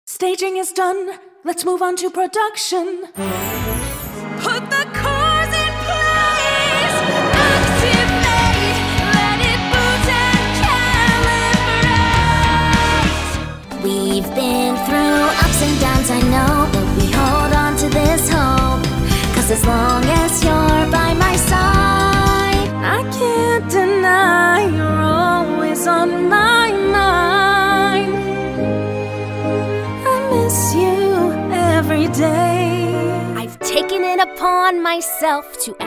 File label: SINGING